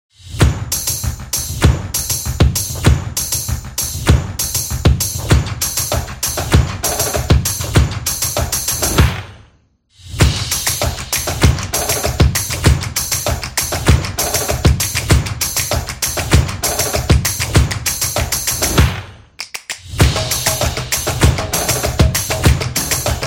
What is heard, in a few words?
Drummer; snaps and claps